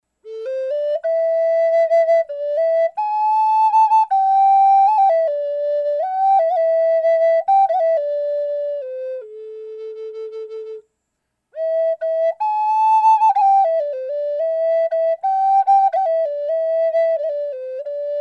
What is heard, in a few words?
Burl Flute